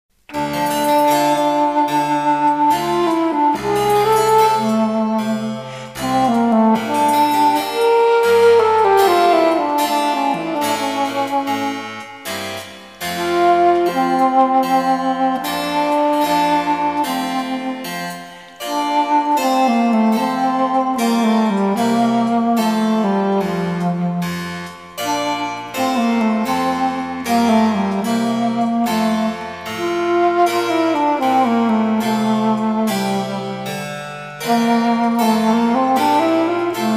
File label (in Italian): basso adagio, querfloete; pergolesi